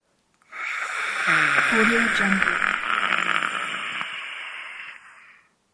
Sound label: vampire hiss and grunt